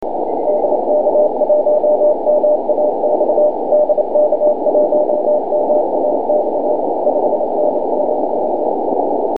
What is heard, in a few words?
cw